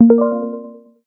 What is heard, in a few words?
Instant, notifications; Realme 8